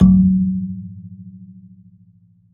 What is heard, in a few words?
AFRBASMP, BASSE AFR; Univers Sons - Raricussions